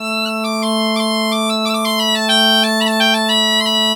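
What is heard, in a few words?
bagpipe 90, Celtic Flavours; USB Soundscan vol.42; AKAI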